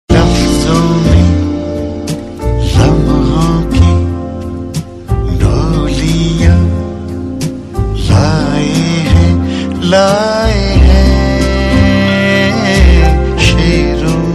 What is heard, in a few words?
full tone ringtone